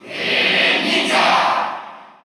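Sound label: Cheer Russian, crowd; Greninja; SSBU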